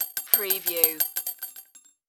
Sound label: Bullet Shell Drop Machine Gun Multiple Cement